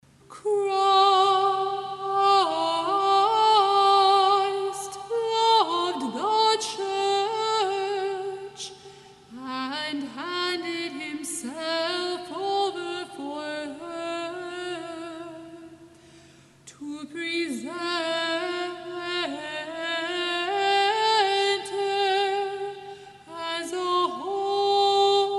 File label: Communion Antiphon; Christ loved the Church